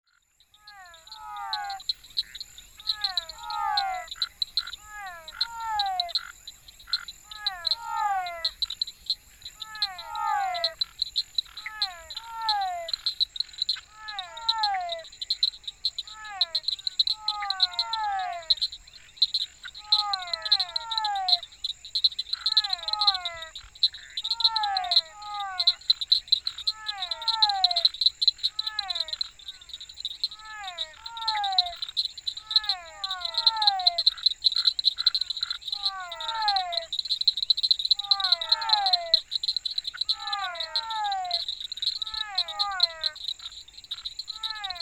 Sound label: frogs and insects